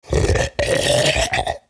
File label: Male Zombie; Project Zomboid